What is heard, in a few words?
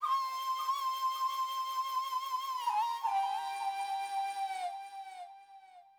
flute, ethno, 160, C